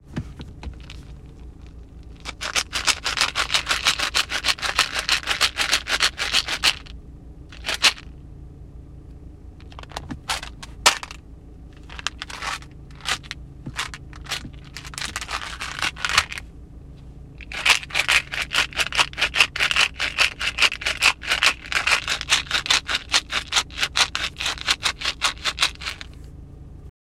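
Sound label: ice shaker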